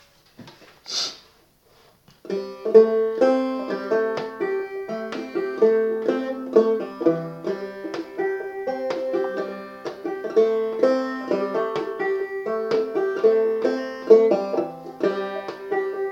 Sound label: i wish banjo, Banjo: Open G; Capo 0; I with my baby was born